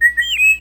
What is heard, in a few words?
whistle